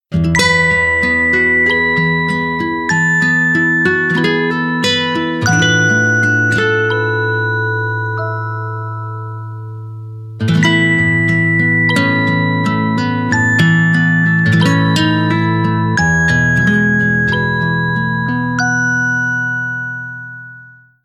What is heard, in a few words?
Guitar; Alcatel; OneTouch-S'Pop-4030D